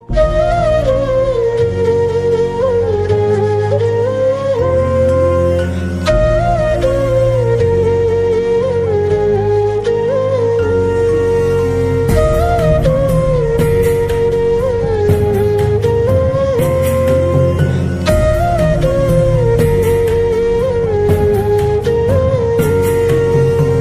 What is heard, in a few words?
Theme Tone Flute; KGF